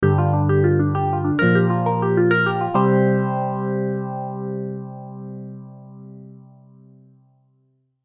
Electric Keyboard, Electric Keyboard Phase Melody Chords; Musical; Keyboards; Blastwave